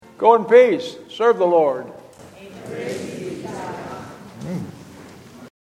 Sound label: Closing Response